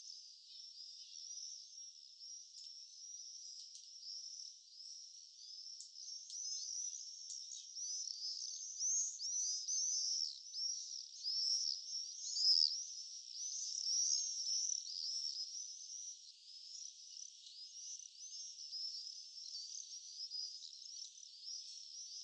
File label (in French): SEQ Martinets